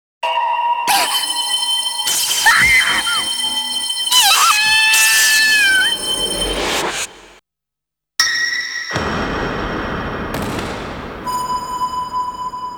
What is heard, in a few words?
Game Over; Athena Awakening from the Ordinary Life Original Sound Trax; Game Over Generic 1 (ESP Running Wildly)